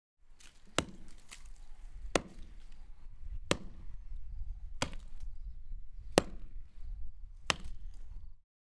Chopping A Tree

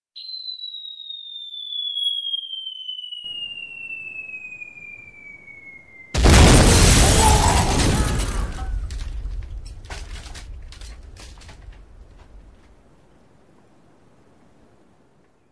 Whizzbang Drop

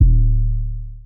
Bass D

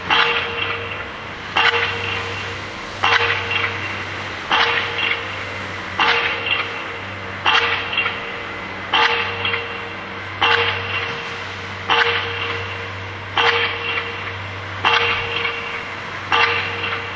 Piledriver

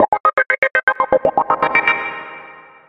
Sound rythm

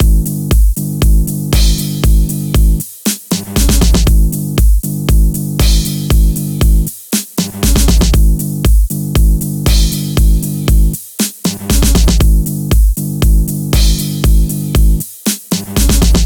Retro Crime Movie Beat

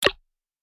Button Click

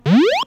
Square Sweep Up

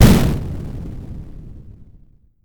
Expl

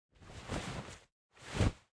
Leather Craft

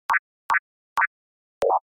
Sci Fi Hud Screen; Select Fx

